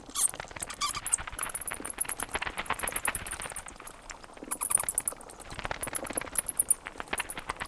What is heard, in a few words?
bats; dota